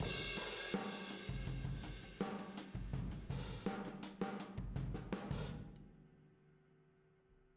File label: Boogaloo - Outro - Room M, Drums, 82 BPM